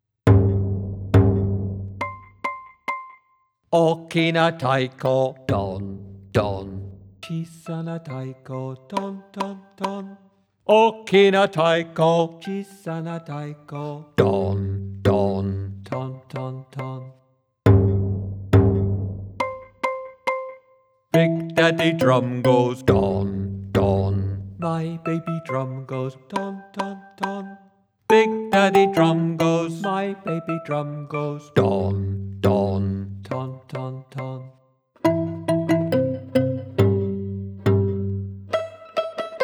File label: ookina taiko